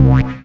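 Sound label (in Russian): кнопка цифровой близкий звонкий двойной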